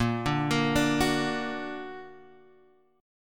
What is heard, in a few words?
A sharp Augmented; arpeggiated